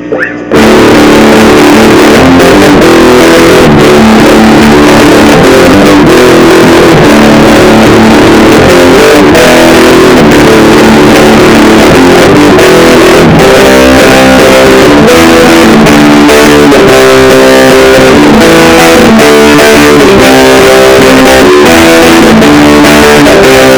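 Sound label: JHS Original, Big Muff Fuzz; guitar riffs; Stoner Rock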